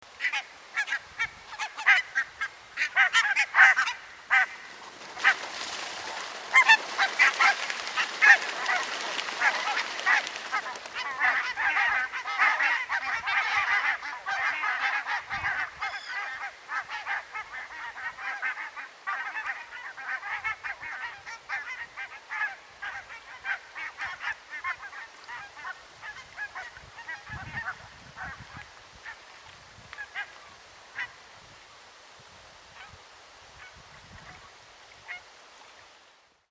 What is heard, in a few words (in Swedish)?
Vitkindad